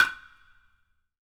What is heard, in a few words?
OHTSU, TUZUMI; AKAI S6000 CD-ROM - Volume 5